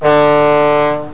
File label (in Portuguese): Buzina, locos